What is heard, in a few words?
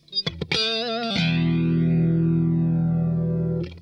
SQUASH LIK, Funk Guitar; 90 s Sample CDs; Zero G